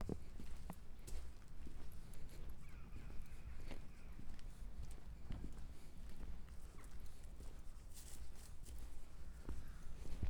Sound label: Walking